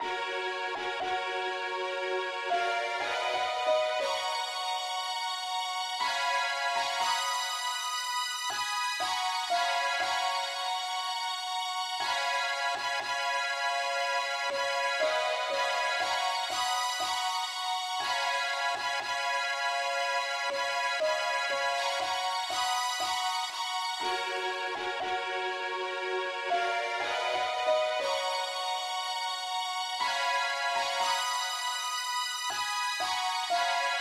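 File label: mandolin Lead key f sharp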